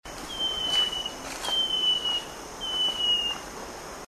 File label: white eared bronze cuckoo